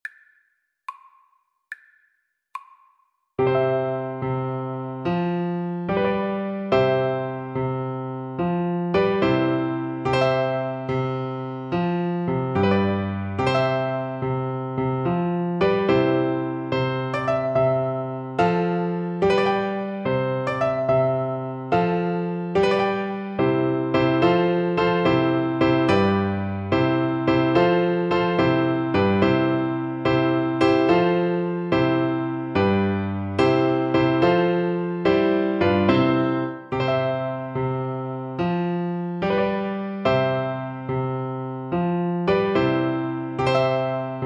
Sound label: wilsons wilde, Flute